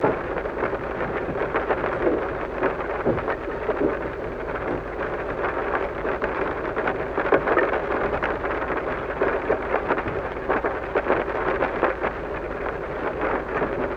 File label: Lofi Rain